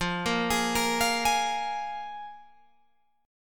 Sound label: F Minor Double Flat 5th, Guitar, Open G